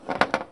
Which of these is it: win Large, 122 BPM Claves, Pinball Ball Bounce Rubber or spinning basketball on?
Pinball Ball Bounce Rubber